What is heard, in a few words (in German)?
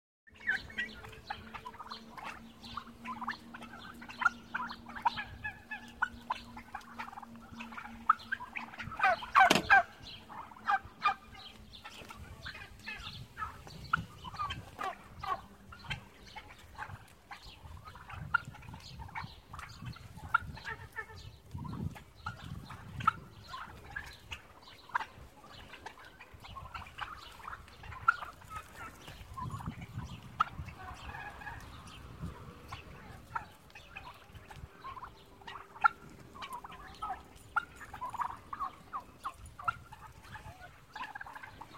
Puten